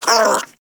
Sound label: Armadillo Vocal Pain; Warframe; Characters; Hunting Critters